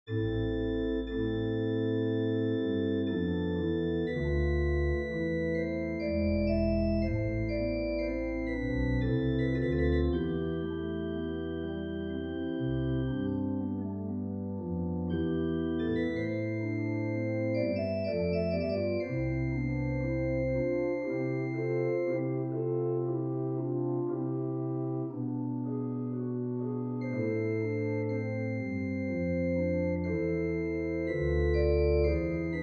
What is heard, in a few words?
Wet, organ; Bux217